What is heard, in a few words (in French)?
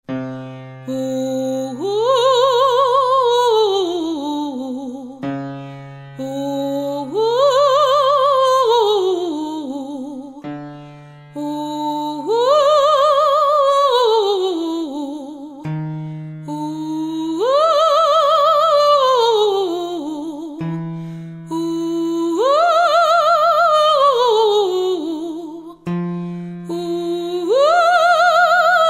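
saut octave soprano ténor; Exercices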